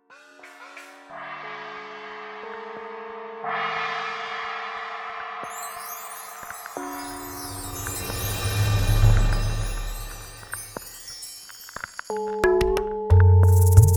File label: DUBSTEP